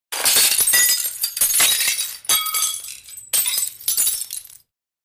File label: Glass Crash Sustaine